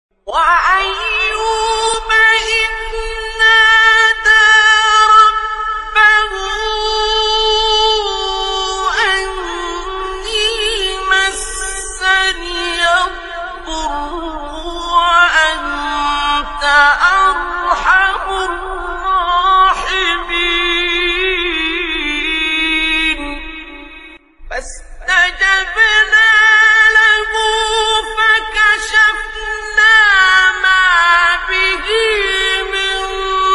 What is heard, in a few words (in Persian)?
تلاوت آیه سوره انبیا; آیه 83 84; استاد عبدالباسط